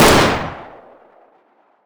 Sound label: mp5 40cal distance fire